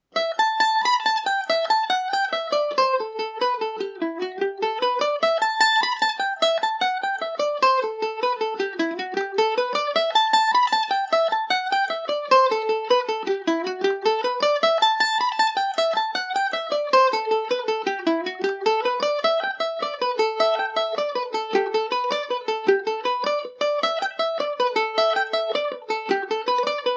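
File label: A dorian, Irish mandolin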